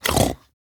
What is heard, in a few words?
Snorts, Grandmother, Vocalizations; Warframe; Deimos